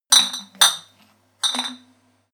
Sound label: milk bottles empty rattle